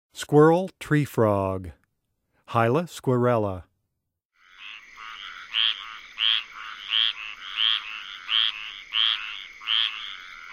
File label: Squirrel Treefrog Call